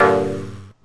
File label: launch D Min; LaunchBad